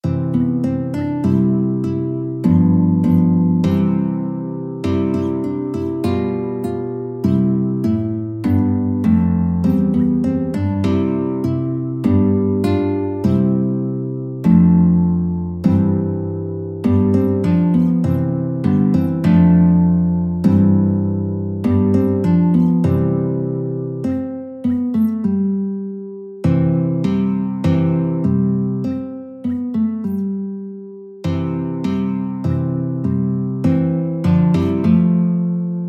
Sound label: anthem, guitar